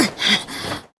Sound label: Grunt; Lewd SFX